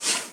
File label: hook pull; casualtiesunknown